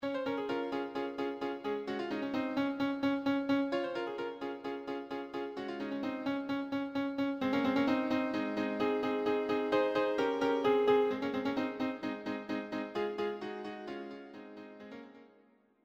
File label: audio sample piano